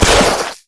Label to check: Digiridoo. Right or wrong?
Wrong